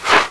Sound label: crossbow miss; Odyssey Demo